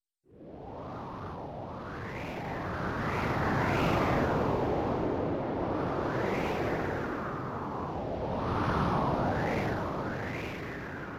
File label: wind